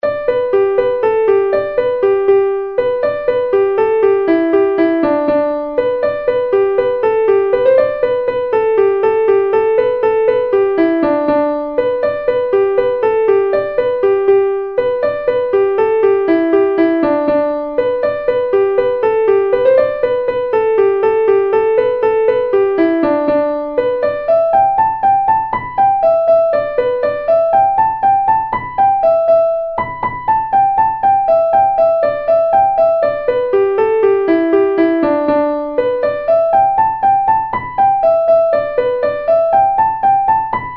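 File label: Battering Ram, Traditional Irish Music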